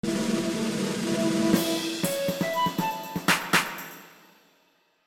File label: fanfare; villager